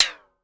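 Timbale Edge Down Fast, Drum Machines Clavia DDrum-AT Pitch Envelope; Pitch Env Various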